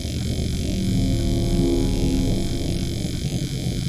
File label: Electricity Beam